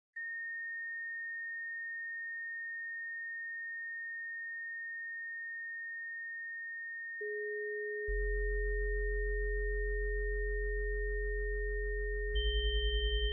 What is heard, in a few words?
gamma chimes